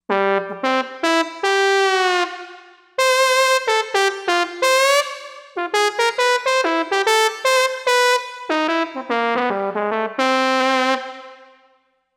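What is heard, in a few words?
Brass Trombone, synth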